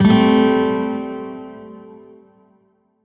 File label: Shawzin Maj Chord K; Warframe; Lotus Shawzin